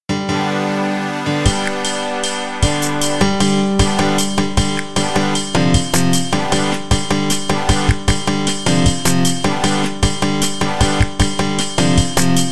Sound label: Music Winner